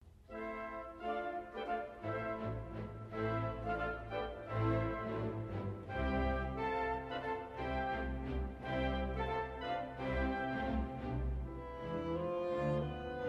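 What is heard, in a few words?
Concerto a minor; Edvard Grig; Koncert lya minor 1 chast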